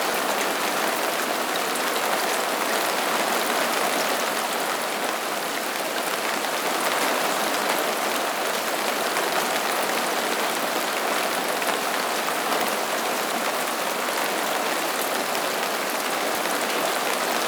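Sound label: ambience rain light outside normal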